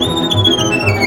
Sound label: VIOLIN LINES; Best Service; World Colours